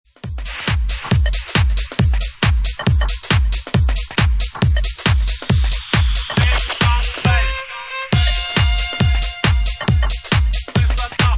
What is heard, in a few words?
Ding Dong Bass